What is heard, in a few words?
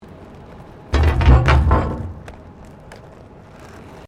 ROLLERBLADING MANHOLE COVER; Sports